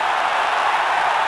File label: CROWD LOOP